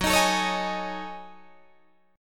Gb Minor 6th Add 9th Gb down Guitar Drop D

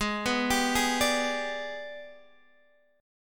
G sharp Minor Major 7th Flat 5th, chord; arpeggiated; x,11,9,12,9,10

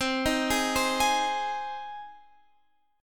C Minor Sharp 5th, Guitar, Standard; arpeggiated; x,15,13,13,13,16; capo=2

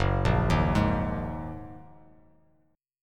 F sharp 6th Add 9th F sharp Bass Standard; arpeggiated; JGuitar

